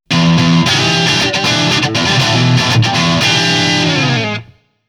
Back Guitar; K-ON

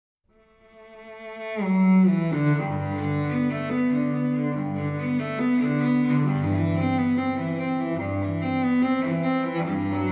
Violoncello solo G dur; Neon Genesis Evangelion; Evangelion S2 Works; Suilen fur Violoncello solo Nr. 1 G-dur, BWV.1007 1. Vorspier

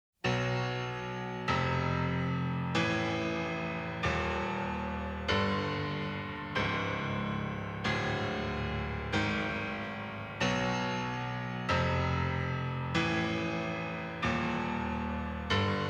rhythm only; Neon Genesis Evangelion; Evangelion S2 Works

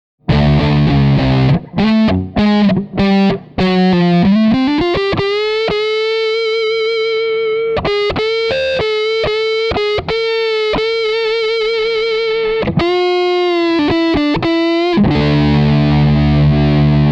Guitar Image; K-ON

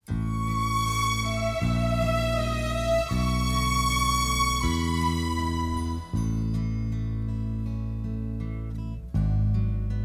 karaoke; Macross Complete

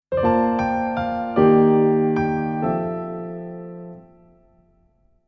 Chime; School Days

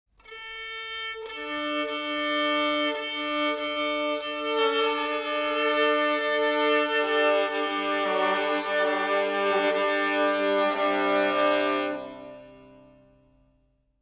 Tuning; Neon Genesis Evangelion; Evangelion S2 Works

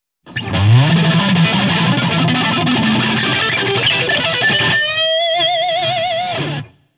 Guitar; K-ON

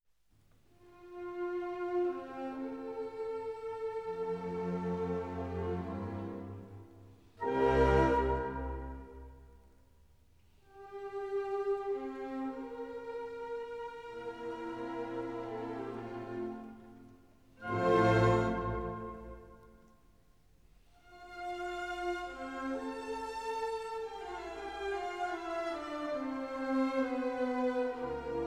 Symphony No. 41 in C Major second movement; Jupiter (Mozart); Legend of Galactic Heroes; Galactic Empire SIDE

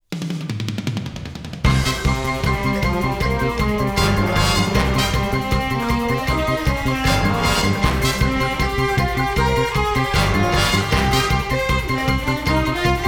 fast tempo; Neon Genesis Evangelion; Evangelion S2 Works

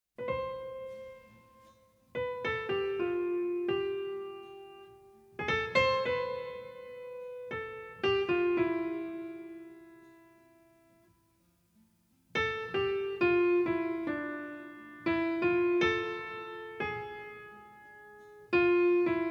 piano, lonely; Neon Genesis Evangelion; Evangelion S2 Works

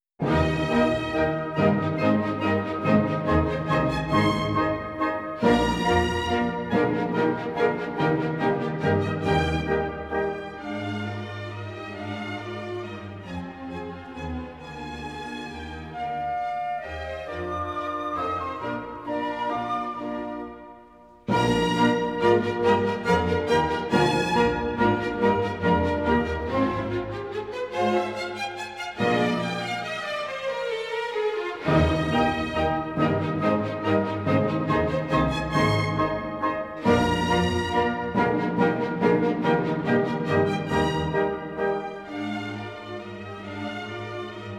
Symphony No. 39 third movement; Mozart; Legend of Galactic Heroes; Galactic Empire SIDE

